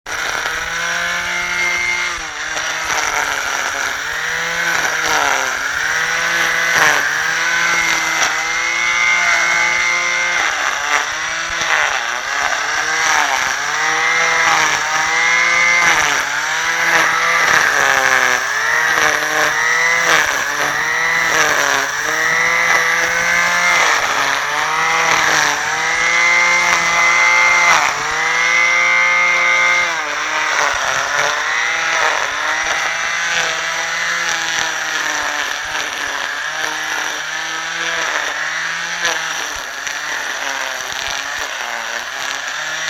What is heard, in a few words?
household garden grass trimmer whipper snipper operating; sound effects one